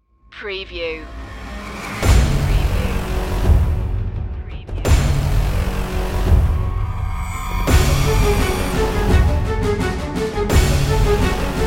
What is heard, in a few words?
besieged no choir